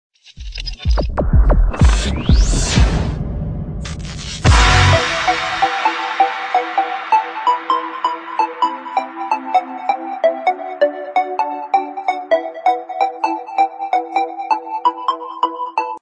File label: rock ringtone